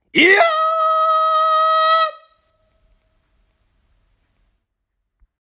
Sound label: voice iyaaaaa